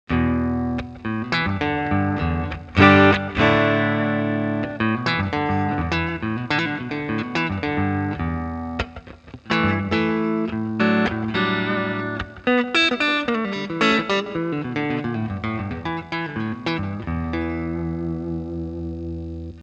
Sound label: Strat 1 x 12 Ribbon sm57 Twin tracker hall; Captor X